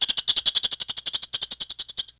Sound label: animal; DAoC; Toa